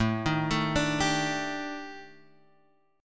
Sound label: D sharp Major 7th Flat 5th, A, guitar chord